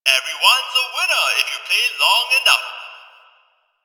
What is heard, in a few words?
Gambling Machine Bark; Warframe; Ambience; Nineteen Ninety Nine